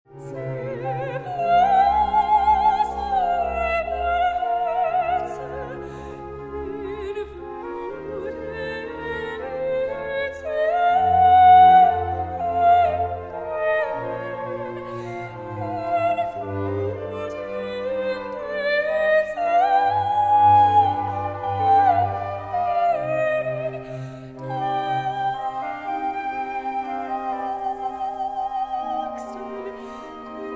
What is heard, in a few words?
St John Passion Aria soprano; St John Passion-35